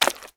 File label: mud step; Golden Crayon Sounds